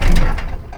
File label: Tram Stop; Warframe; Grineer; Tram Connector Curved